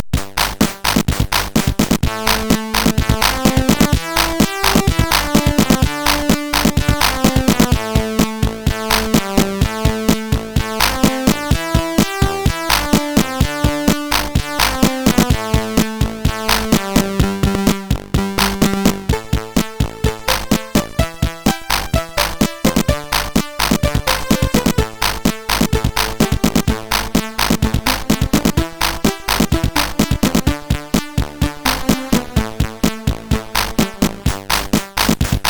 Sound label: Zound Muzak intro, sid MOS6581R3